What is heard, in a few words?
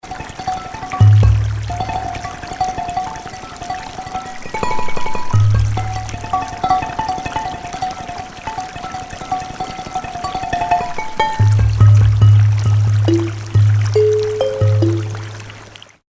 downshift